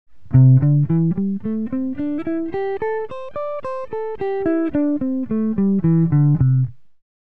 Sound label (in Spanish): penta menor patron